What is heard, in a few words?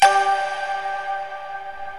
STEEL DRUMS